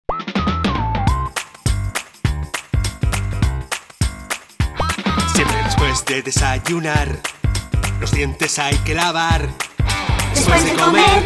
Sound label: jingle; buco Dental